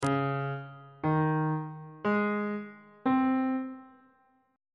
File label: c sharp major 7th suspended 2nd chord intervals; bass clef